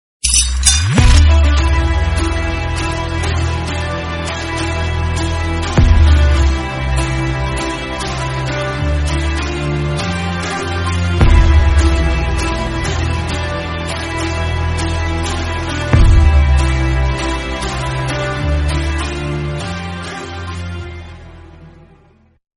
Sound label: music bgm instrumental; kgf 2